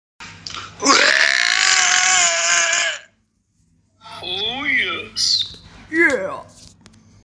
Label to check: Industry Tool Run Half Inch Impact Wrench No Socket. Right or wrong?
Wrong